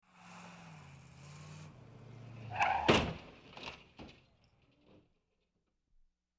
schleudern und zusammenstoß, Auto; Auto-Zweiraeder

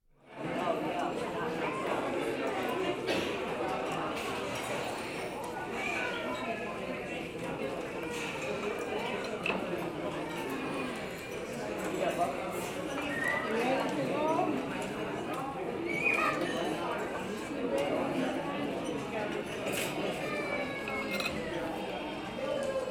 cafèteria, Alltagsgeraeusche

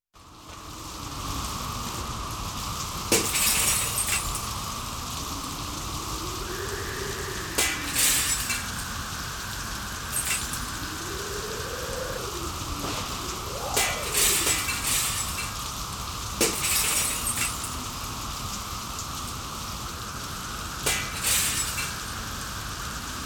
wirbelsturm, Geraeusche, Katastrophen

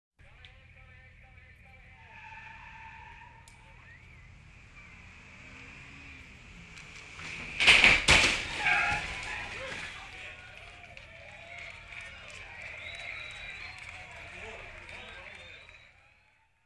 autounfall mit schrei, stunt mit applaus; Auto-Zweiraeder

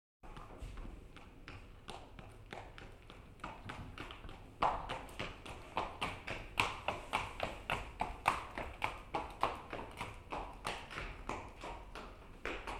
sport - pferd im stall

